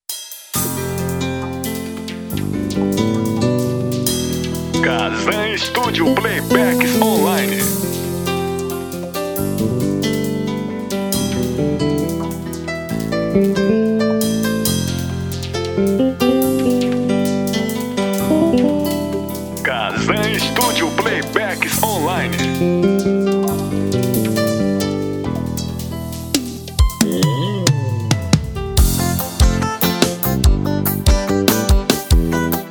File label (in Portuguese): playback brega; kazamstudio